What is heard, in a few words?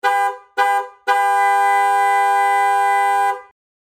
AM80SX HI LO, horns; aston martin; FIA